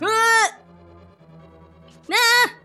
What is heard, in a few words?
speed noises